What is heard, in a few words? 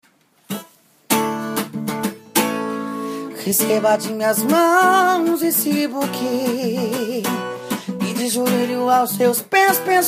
98bpm